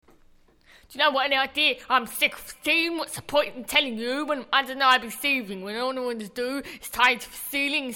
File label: vocal no clipper; Clipper on external audio; Clipper examples